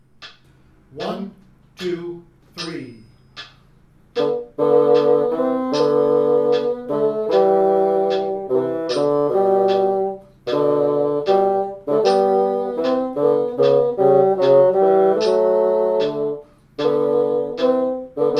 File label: Carry Bassoon 1 and 2 76; Rubank Elementary